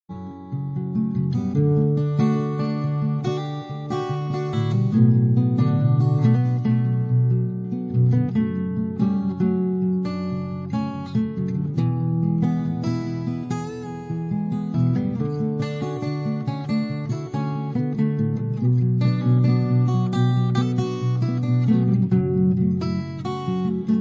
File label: Acoustic; Sanxion Loader